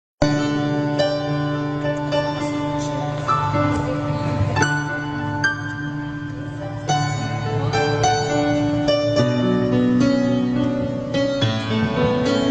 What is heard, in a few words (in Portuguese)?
Tua palavra e lampada, cantos